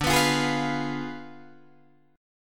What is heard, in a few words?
D Diminished 7th D down Guitar Open D